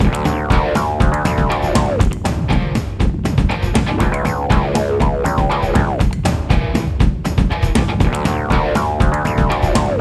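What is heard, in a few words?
Music Loop; Robotwife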